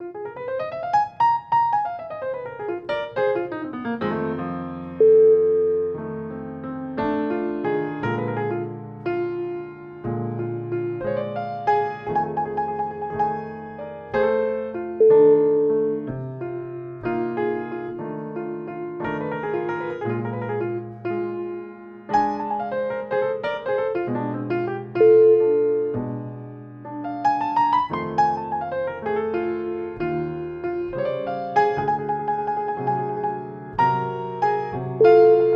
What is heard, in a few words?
bluesy piano